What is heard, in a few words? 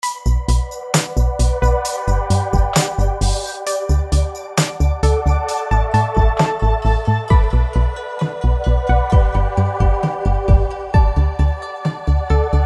SQ Generative Chillout; Vengeance Sound Avenger 2; Generative 1